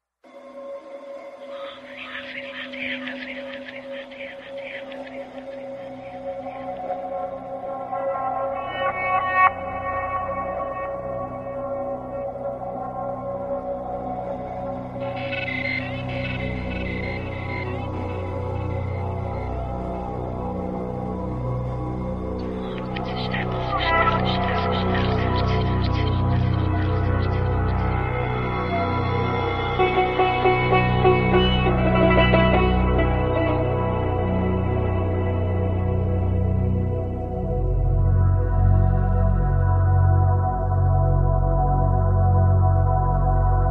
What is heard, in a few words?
Ambient Mix